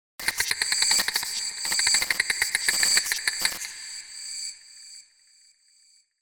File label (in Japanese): natu